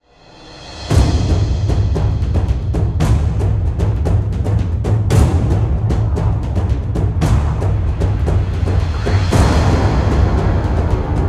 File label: Music fight; Gogs